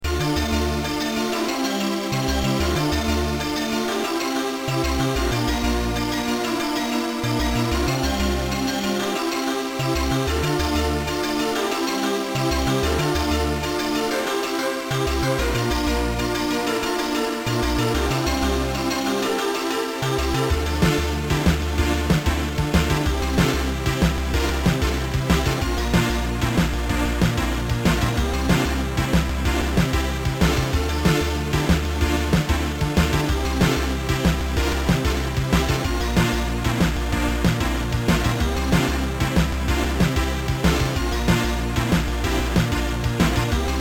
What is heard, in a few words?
STE STEREO 10013HZ; HEXTRACKED